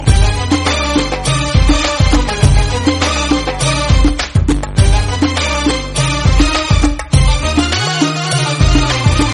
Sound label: malayalam arabic ringtone